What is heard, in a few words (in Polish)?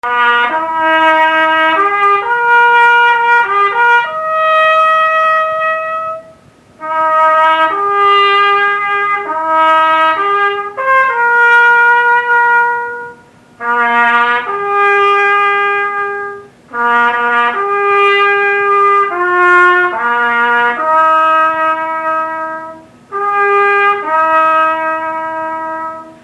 Hejnał; Choroszczy